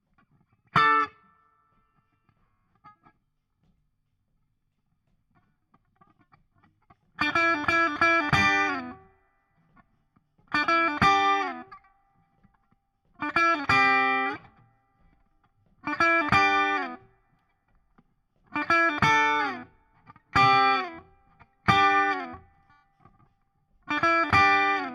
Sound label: Guit Solo Back